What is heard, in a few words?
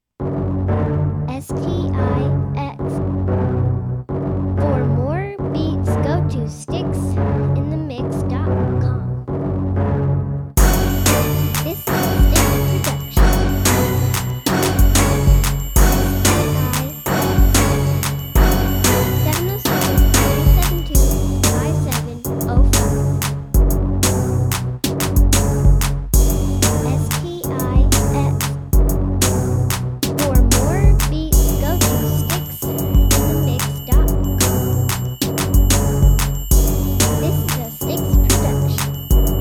junglez